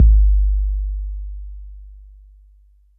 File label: Roland 808 BD